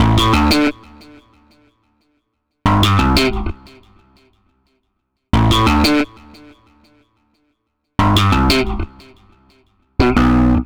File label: Processed Slap Bass, Electric Bass